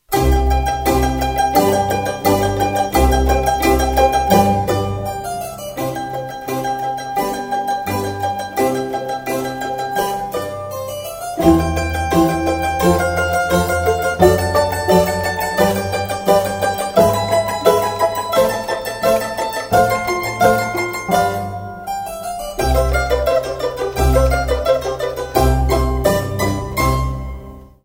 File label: concerto for mandolin and strings in d; vivaldi